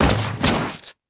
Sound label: bodyfall stone, foley